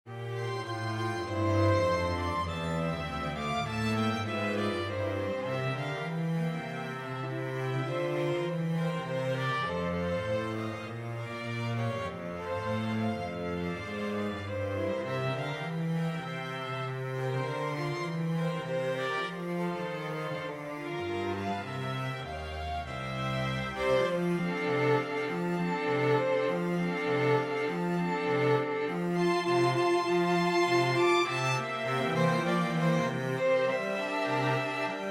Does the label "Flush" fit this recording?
No